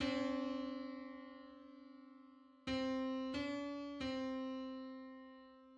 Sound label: Neutral second on C